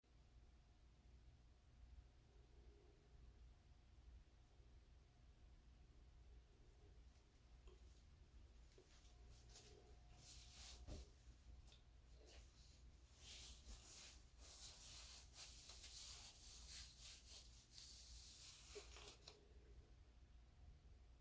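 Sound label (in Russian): надеваю атласные трусики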